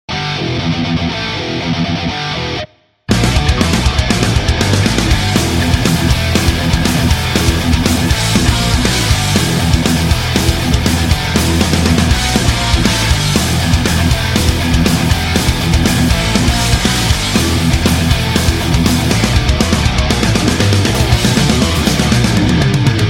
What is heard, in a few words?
metal ring background music tone ringtone